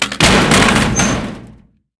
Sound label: riffle drop metal thin; STALKER; IWP